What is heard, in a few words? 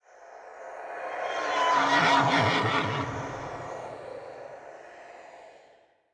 Ghost